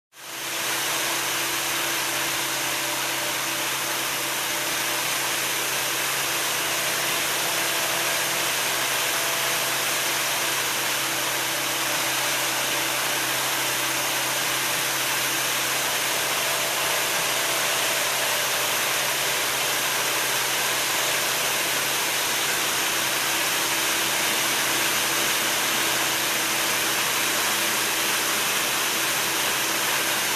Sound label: Machine running noise